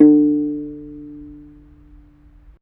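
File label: African HARP D 4; AFR. HARP1; Heart of Africa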